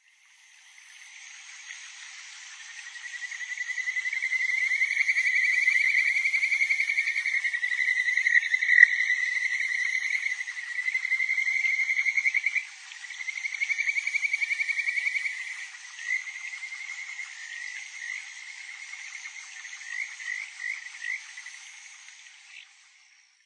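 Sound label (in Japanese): kajikagaeru, kajika frog; nashushiobara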